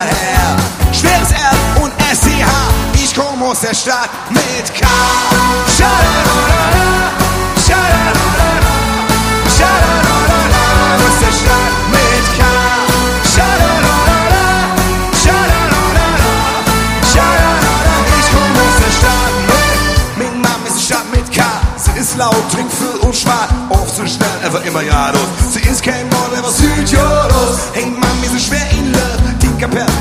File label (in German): live mit orchester